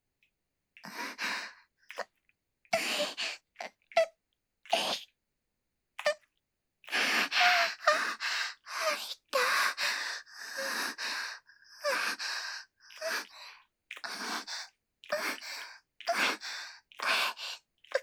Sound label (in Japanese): 喘ぎ（SEあり）